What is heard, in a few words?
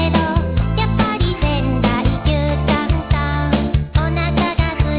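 guitar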